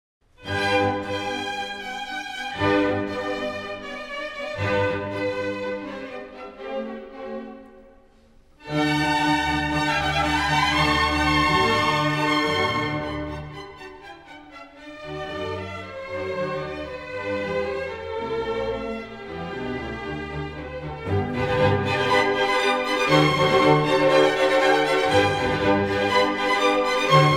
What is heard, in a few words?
Violin Concerto in G major; Mozart Violin Concertos 3 & 5